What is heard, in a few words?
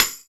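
PER PROCU, Procussion, percussion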